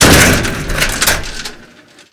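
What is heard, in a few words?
destroy filecabinet metal; Call of Duty Modern Warfare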